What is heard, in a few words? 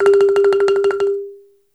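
BALAFON, Pitched Percussion; E-MU Producer Series Vol. 7 – Old World Instruments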